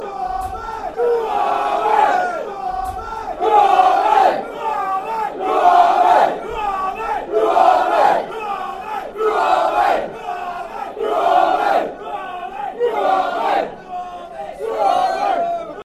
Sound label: blue army, fan chants; gfc